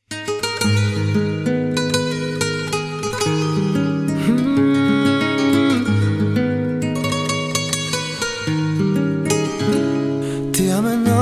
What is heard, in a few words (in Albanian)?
(Acoustic); muzik shqip